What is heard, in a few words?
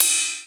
ride; foxdot default